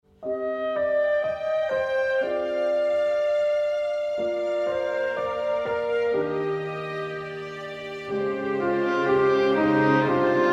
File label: Field BGM; Xenoblade Chronicles 3